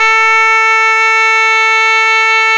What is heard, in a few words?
A 1, schluetter; SoundBytes - Early Patches